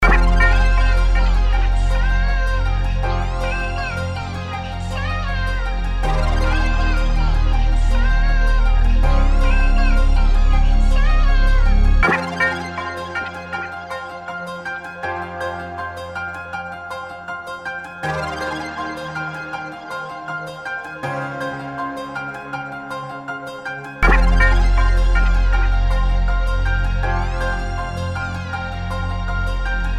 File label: Fm hard melodic 160